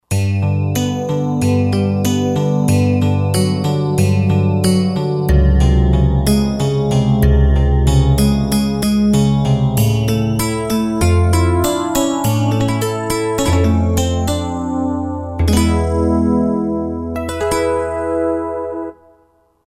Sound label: Crystal Guitar, Roland JD-990; Factory Patches